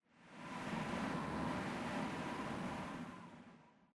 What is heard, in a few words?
eject pilot apex wind; Titanfall 2